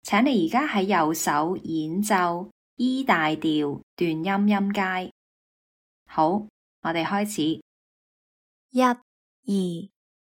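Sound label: E major staccato scale right hand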